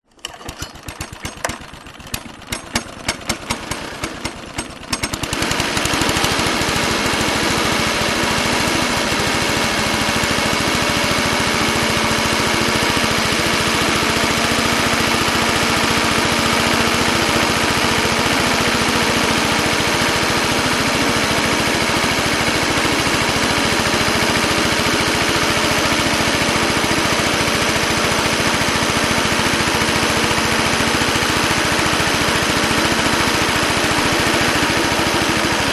lawn mower petrol Ransomes Automaton Certes 18in Start Run Stop; selectmood